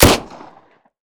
pistol shot; Pain Train

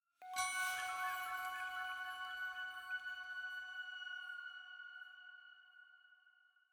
item Jingle Flute Chime F sharp

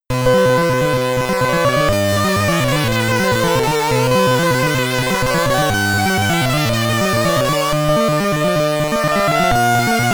Name Entry, SID Stereo; zybex; commodore 64

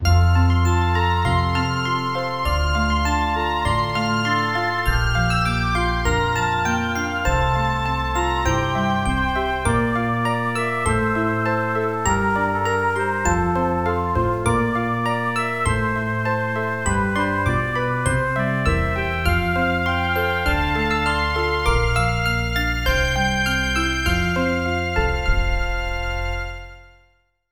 Menu Screen Music

